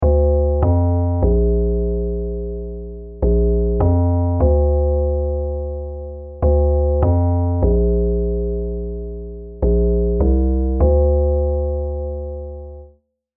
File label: Bass; Ice'd Out Collection